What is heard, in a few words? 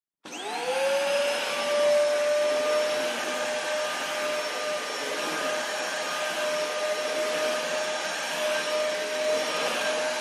household vacuum cleaner power on off